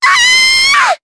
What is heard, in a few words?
Vox Damage, jp; voices heroes jp; Dosarta; kingsraid